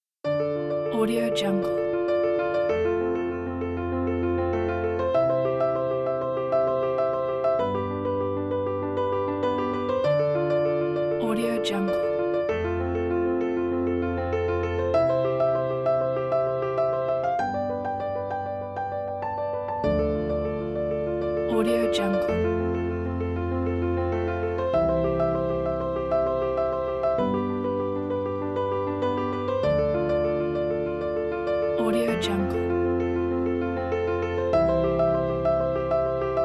emotional dramatic cinematic

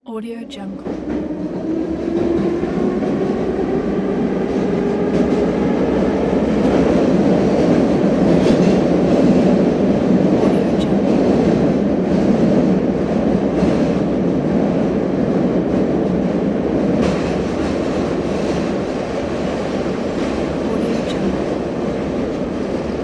inside subway train

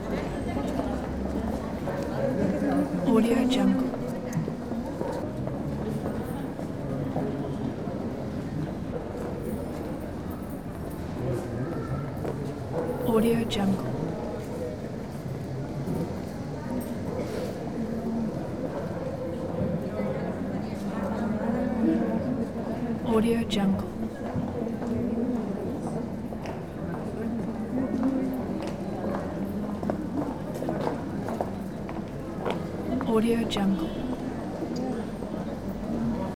street crowd

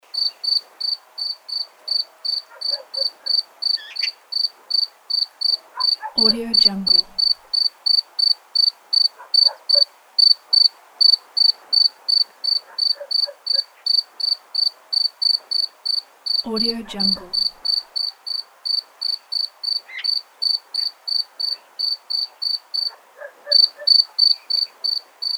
night atmosphere in village with cicada

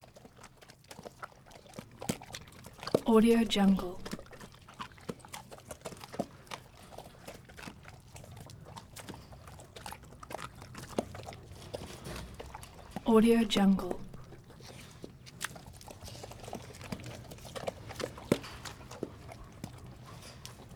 wolves eating 2 or 3 crunches wet slobbery

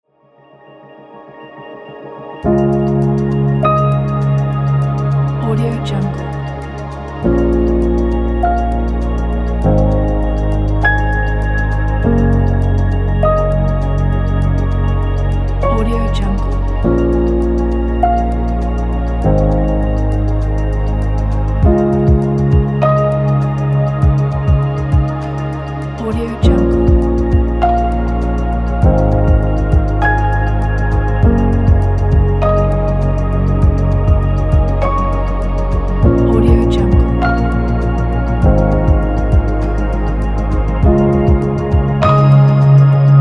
soundscape electronic ambient

